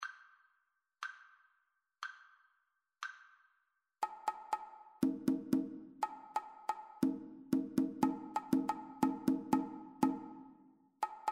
two part rhythmic dictation; ear training

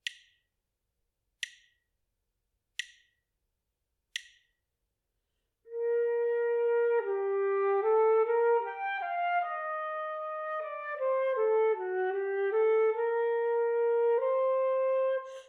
melodic dictation

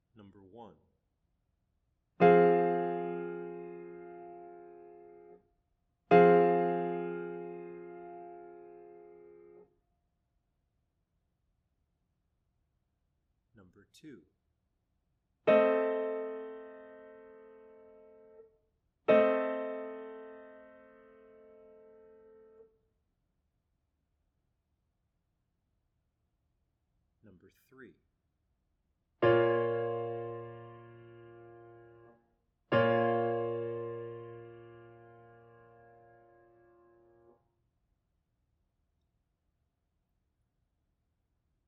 chords; ear training

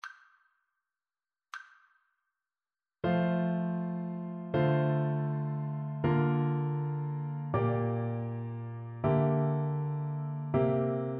harmonic dictation

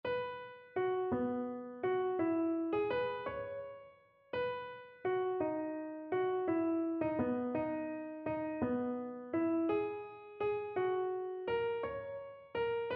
melodic error detection

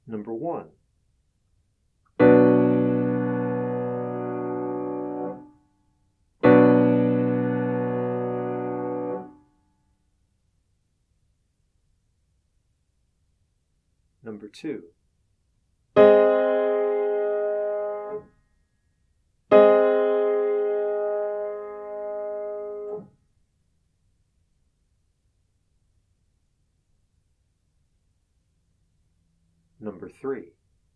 chords

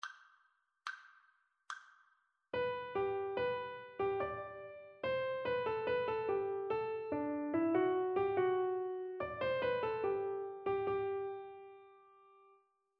rhythmic dictation

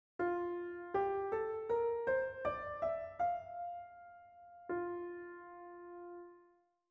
F maj scale

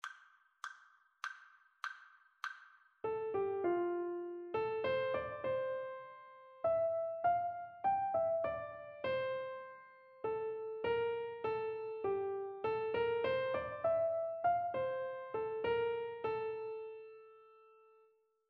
melodic dictation; ear training

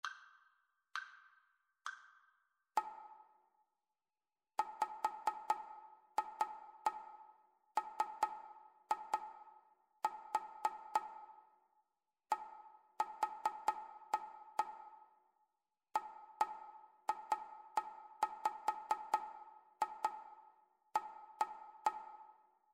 rhythmic dictation

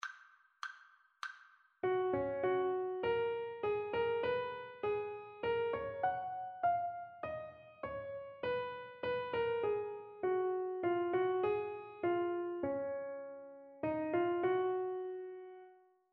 partially completed melodic dictation

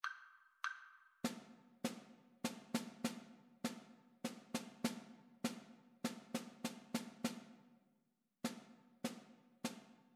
rhythmic dictation

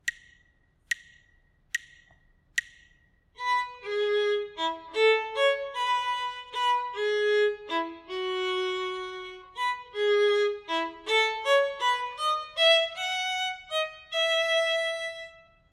melodic dictation; ear training

